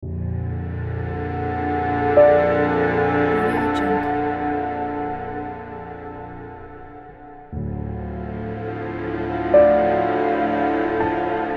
sad cinematic background loop

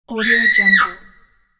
powerful female halloween scream

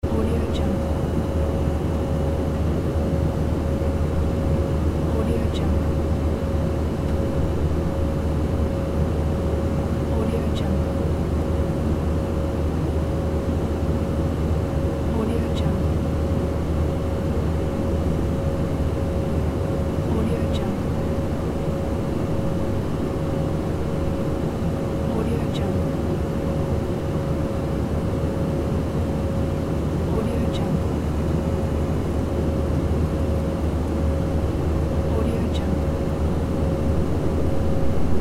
airplane on board; medusamusiclab

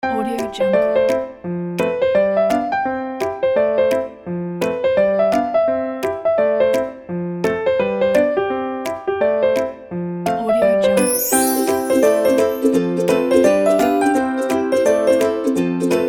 funny ukulele kids

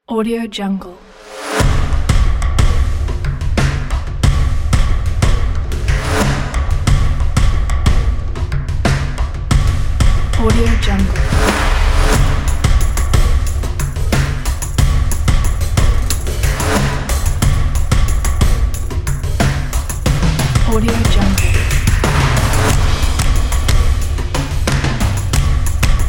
drums cinematic

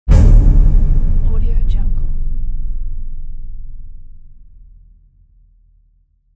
impact

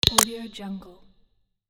click mouse

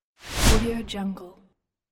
slow whoosh; 3dhome

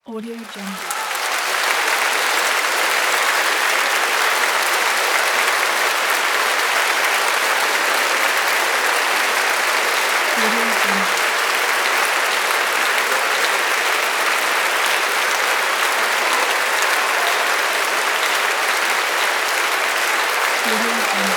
concert applause

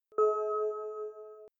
menu button effect; led-wallet

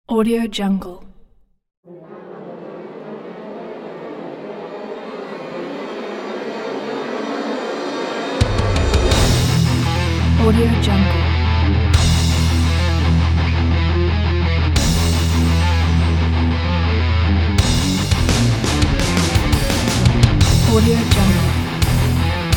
cyberpunk

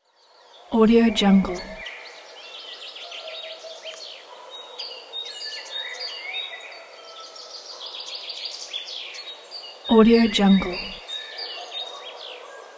birds in forest

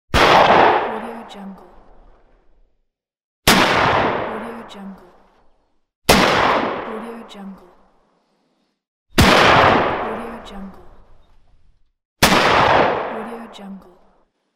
antique shotgun shots

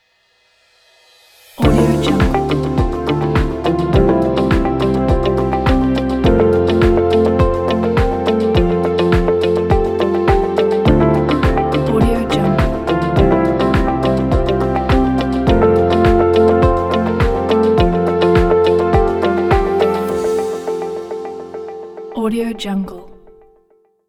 uplifting ident

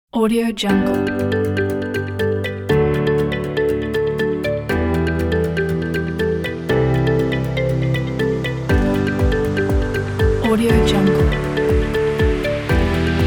upbeat corporate uplifting

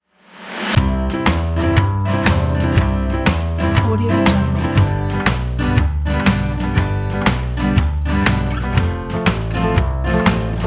summer kids fun cartoon

